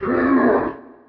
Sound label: Vocal; WCWDEMO